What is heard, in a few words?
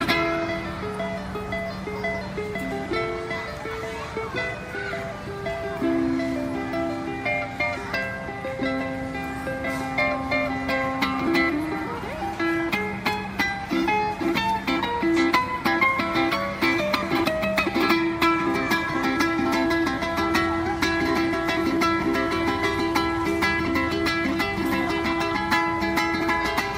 ukulele whizz ringtone